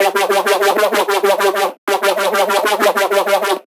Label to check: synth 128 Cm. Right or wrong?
Right